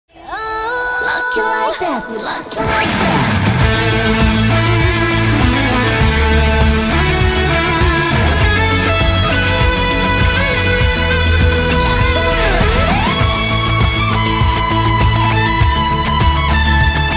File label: BGM ringtone